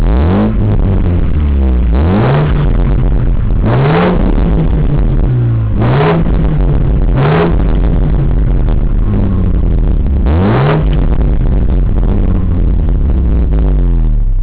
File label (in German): Gasspiel; astra